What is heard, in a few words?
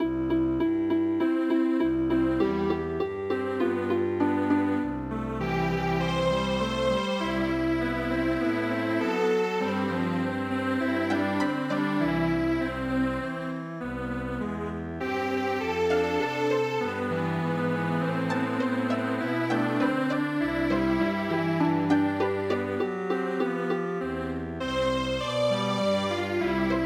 Strings Quartet